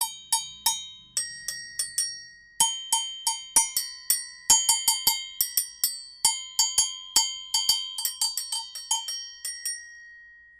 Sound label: Agogo; Goldon